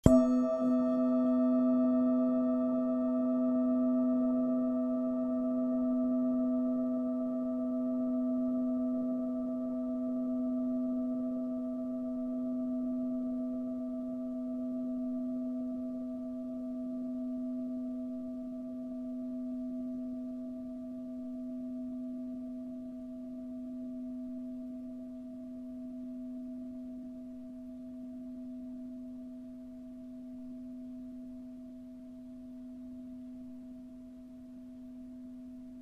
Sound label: planet bowl; planet bowl sonne